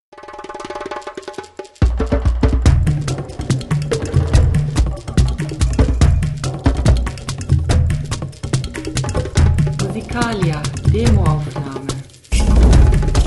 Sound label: drum song